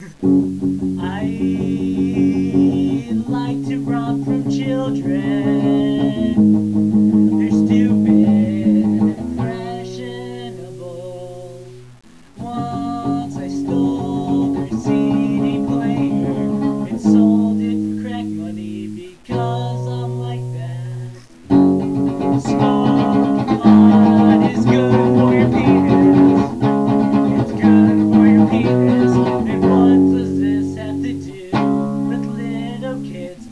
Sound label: children, armenian